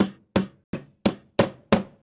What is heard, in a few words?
Hammer; Zomboid 41.78.16; Project Zomboid